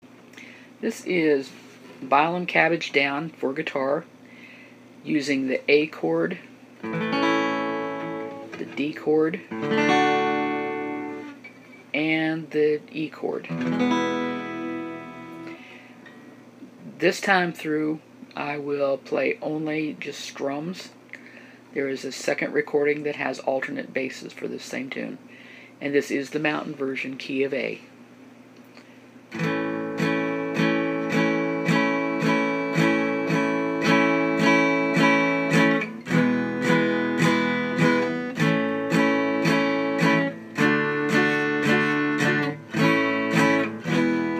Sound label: Bile Em Cabbage Down Mtn Ver Key of A Guitar strums only